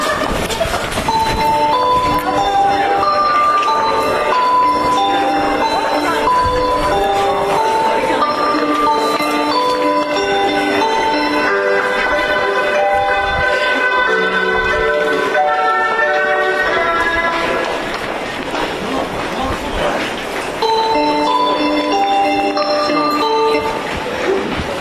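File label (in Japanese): 池袋駅, メロディ; 山手線; 新宿・渋谷方面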